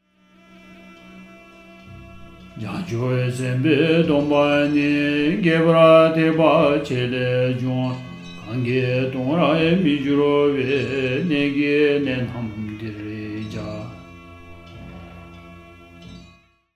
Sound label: Verse Recording with music